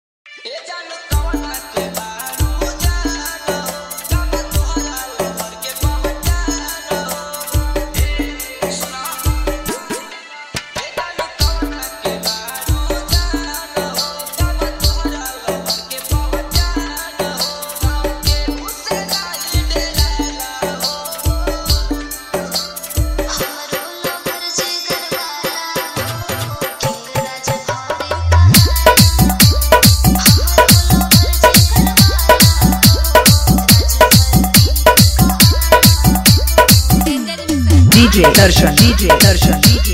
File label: Dj Song Instagram Viral Song Hard Bass Dj Remix